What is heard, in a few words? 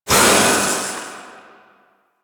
Void Release Short; Weapons; Warframe; Duviri; Incarnon Adapters